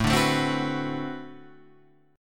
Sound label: D 13th, guitar chord